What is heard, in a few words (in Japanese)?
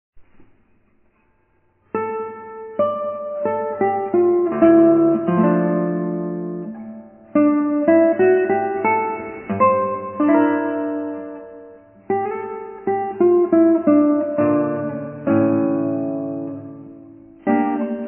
ギター; エンデチャ、マリンで